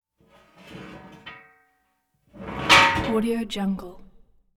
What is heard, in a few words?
metal pipe heavy hollow pipe rolls scrapes